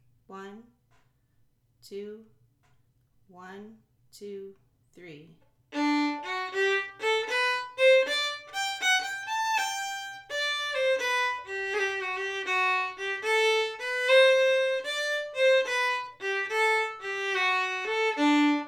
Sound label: Concertina